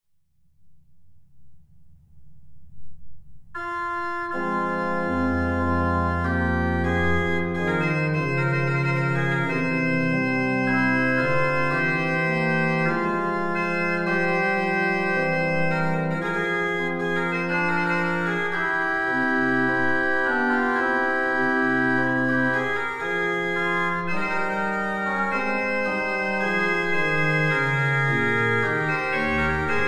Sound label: organ, Partita; Buxdechude